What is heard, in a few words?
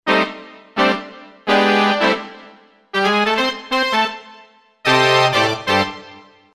brass